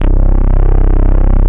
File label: SYNTH, synthesizer bass; VFX-SD